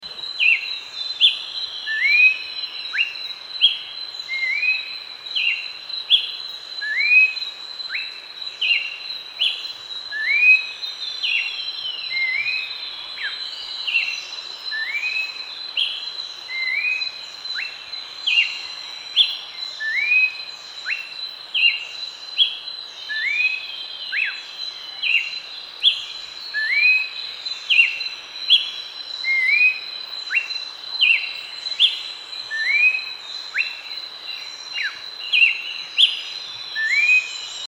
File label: Malacocincla sepiaria Horsfields Babbler; Avibase